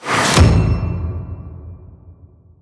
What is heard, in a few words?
UI new record